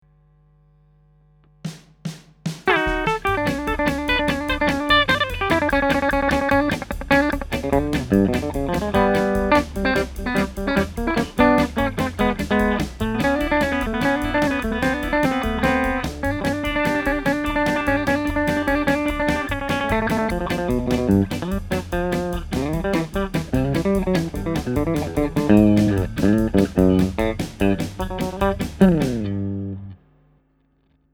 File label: western swing; Redd Volkaert